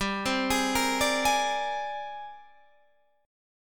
G sharp Major Sharp 11th G, chord

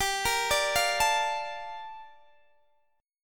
G Minor 9th Guitar DADGAD

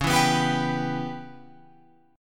C sharp Minor 7th Flat 5th C sharp Guitar Open F; C#m7b5 strummed; JGuitar

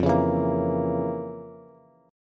F Major 7th Suspended 4th Sharp 5th F, Bass; FM7sus4#5 strummed; JGuitar

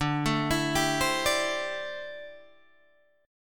D 7th Suspended 2nd Suspended 4th, Guitar Open G

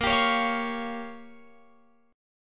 A sharp Minor 6th A sharp, Guitar Open F; A#m6; strummed

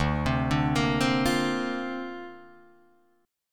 D-Minor 7th Sharp 5th D Guitar DADGAD, chord

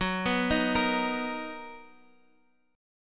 B Major 7th, guitar chord

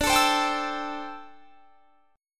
D 7th Suspended 2nd Suspended 4th D; D7sus2sus4; strummed; 22,24,22,24,22,24

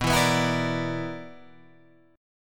B-Minor Major 7th Double Flat 5th

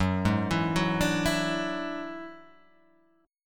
F sharp 7th Suspended 2nd Sharp 5th F sharp down; arpeggiated

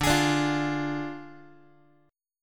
D Diminished Guitar, chord; x,10,11,12,9,x; 4A,4E,5A,5D,5G#,6C#; strummed

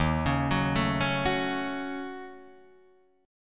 Eb Minor 7th Flat 5th Eb down Guitar Drop D

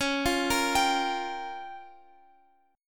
C sharp 6th Flat 5th C sharp down Guitar Open F; arpeggiated; C#6b5; JGuitar

